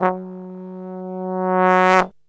F#1, Brass; Best Service; Brass Super Section

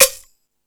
Percussion FX, Drum Machines

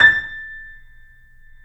A, Piano; InVision Interactive - The Piano

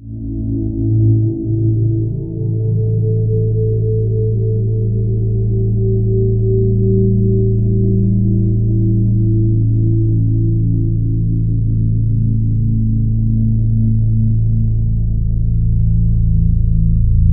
Ominous Drones, VOX STYLE; USB Soundscan vol.48; AKAI

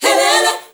ZULU CHR, FEM. CHOIR

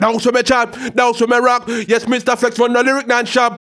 RAGGA MUFF, B maj; Sound & Vision - Gigapack II

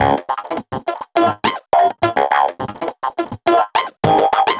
Drum Loop, Drum Loops

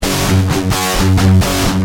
Guitar Heavy, Industrial

